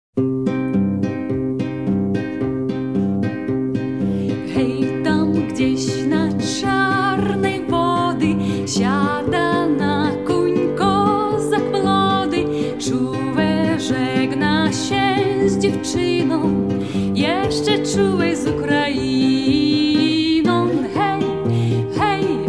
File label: Polish folk song; Hej sokoly; Ukraine